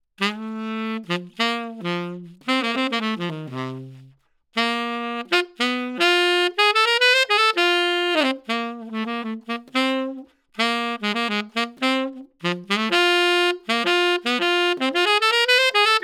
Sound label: SAX FET